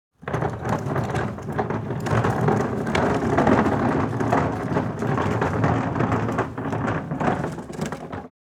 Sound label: Drag plastic trash can on pavement scrapes